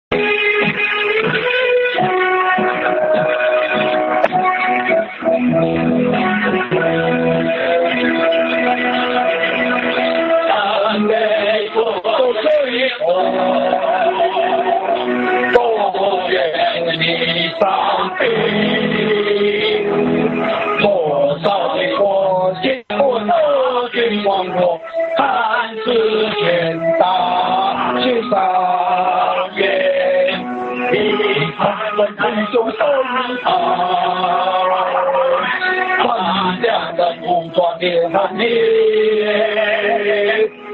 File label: hymn; worship